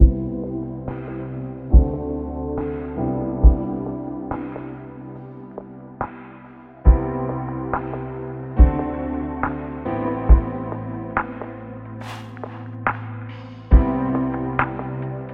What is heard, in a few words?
sleepy